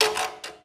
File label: fx scrape